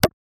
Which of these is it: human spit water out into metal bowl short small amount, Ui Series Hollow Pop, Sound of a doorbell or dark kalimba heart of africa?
Ui Series Hollow Pop